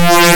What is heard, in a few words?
drone